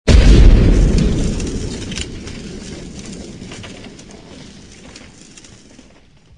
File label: blast